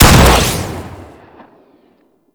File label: Bio Weapon Fire 2D; Warframe; Weapons